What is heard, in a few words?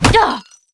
ArcDivina F damaged